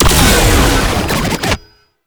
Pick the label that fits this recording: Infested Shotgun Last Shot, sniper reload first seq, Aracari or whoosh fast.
Infested Shotgun Last Shot